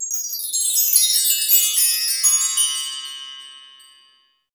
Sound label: Chimes; Roland BK-5; Percussion